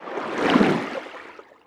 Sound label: creature seamonkey swim slow